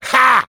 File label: Demoman laugh short, fr; Rire court 2